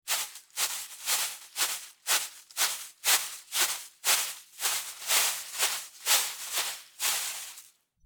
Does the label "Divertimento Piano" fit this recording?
No